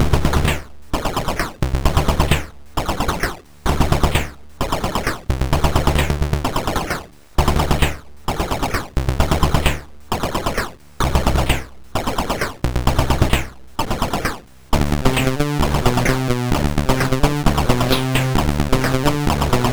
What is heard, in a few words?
sid MOS6581R2; California Games